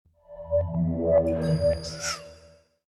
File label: Power off, phone